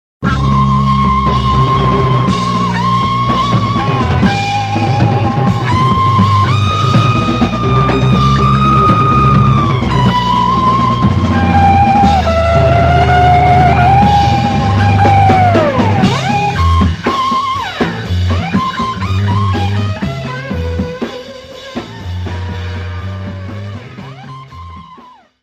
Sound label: Walk